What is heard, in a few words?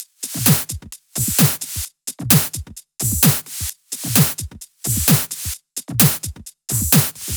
130BPM Organity Kit; Vengeance Samples